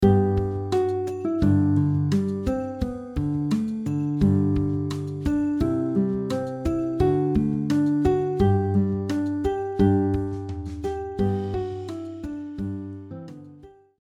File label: guitar; Joy to the World